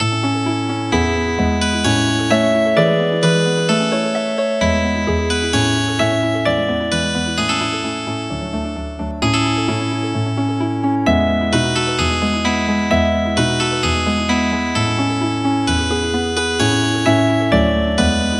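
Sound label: Healing Harp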